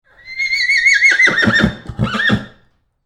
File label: Horse Whinny; Animal; Horses; BlastWave